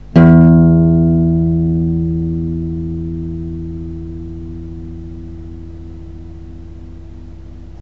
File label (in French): mi grave, guitare